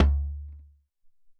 Djembe, Alesis SR-18

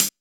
Boss DR880 hihat; drums

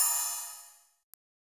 RIDE, Akai MPC-3000; drums

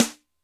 Snr Attack Rap, Alesis D4; drums

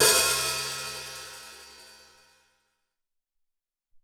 Open Hat, Alesis SR-18, drums

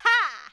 perc voice, Roland MC-909

